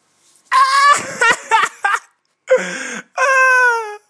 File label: laugh